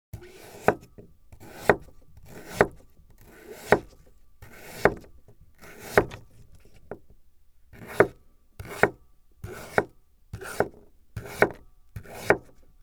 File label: radish cut